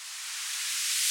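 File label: reverse cymbal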